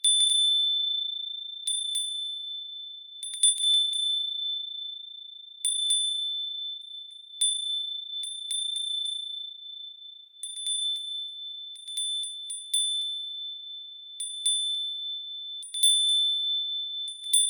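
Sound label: wind chime; furin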